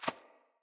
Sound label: Rat Guide Step, Foley